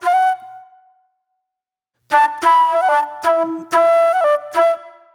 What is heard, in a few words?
Mellow Flute